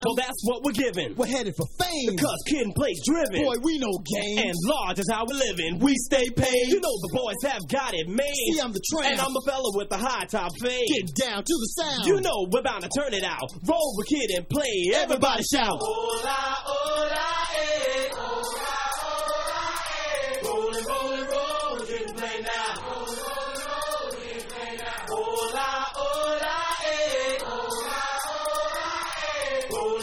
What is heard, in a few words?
Acappella